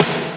GRIT SNARE

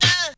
VOX; Zero G; Man Machine

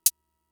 closed hats, Fricke MFB-522

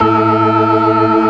organ, all stops; Keith Emerson Signature Series

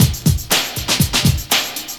LOOP120; Sounds Good - Eurotech

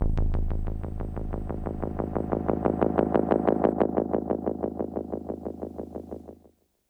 Flyby Hi; Lost Tapes From Mars

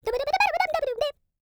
SCAT; Spectrasonics; Vocal Planet; Jazz FX; CHEESESCAT

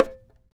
TAR; Roland - LA Composer vol. 3

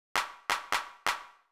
Beat, Old Skool House, 132bpm

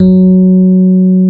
F#2, Electric Pianos; Wizoo

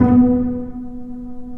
Bass Piz G B2; Digital Sound Factory Virtuoso

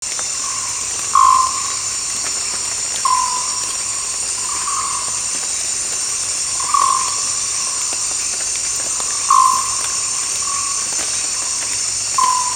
Rain Forest, environments; Sonic Foundry (Sony Creative Software); Ambient Grooves

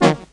chord one shot brass D#, Classic Chicago House; Sample Magic; Chord Shots

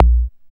BD ANALOG; Sound & Vision - Gigapack II CD 2

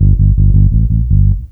bass loop, Ragga & Dub Reggae; AKAI; USB Soundscan vol.27

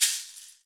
shakers

